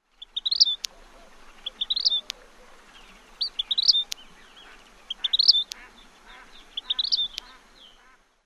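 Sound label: vermilion flycatcher songs with bill snaps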